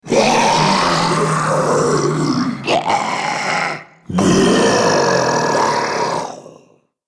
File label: zombie mob